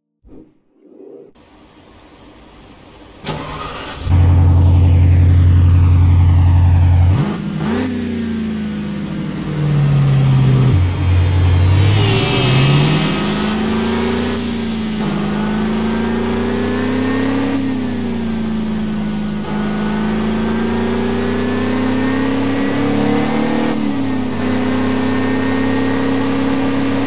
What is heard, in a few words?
spyker c8 laviolette; TDU-1